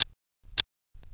clock

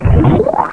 OIL; mega2

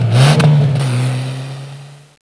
click

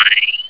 Laser Disk Sound; CephaloTron

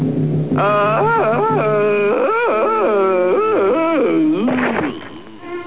aaahaaah

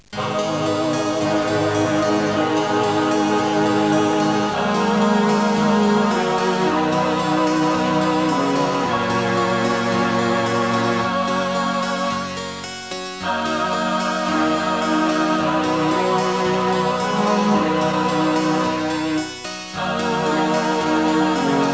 lo strgs

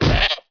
goat hit; Zeus

